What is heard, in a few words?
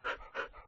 panting, wolf; mob; Minecraft